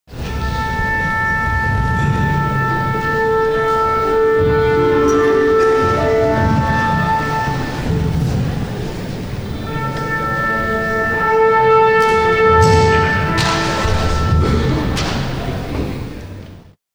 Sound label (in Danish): tonestemning